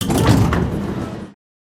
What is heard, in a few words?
platform leave; avant gardens